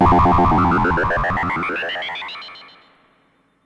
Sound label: Ring mod FX